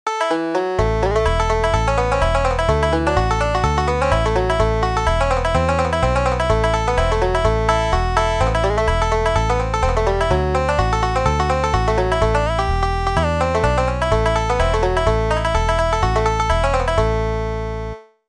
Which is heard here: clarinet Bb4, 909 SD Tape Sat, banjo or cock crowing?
banjo